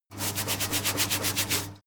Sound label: mechanic sink running water brush